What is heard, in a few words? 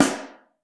SN3 Mono, snare